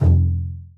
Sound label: crown, Bass